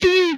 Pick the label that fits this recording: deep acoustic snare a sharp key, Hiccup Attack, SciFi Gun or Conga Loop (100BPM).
SciFi Gun